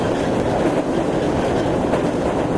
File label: 205 70 bridge; tetsuon